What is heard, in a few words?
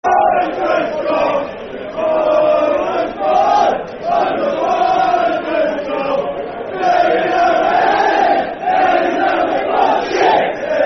Wanderers Scalf Football Chants (Soccer Song); Wolves; wolverhampton wanderers; fanchants